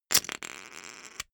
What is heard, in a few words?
poker chip stack rattle short fall